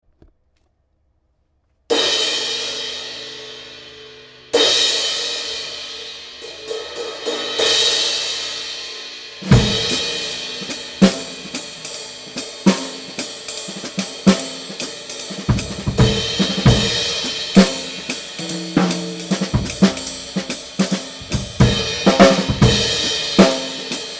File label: 18 K Istanbul 1565 gr